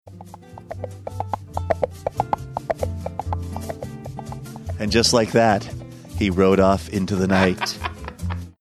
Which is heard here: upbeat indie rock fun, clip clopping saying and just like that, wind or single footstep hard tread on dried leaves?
clip clopping saying and just like that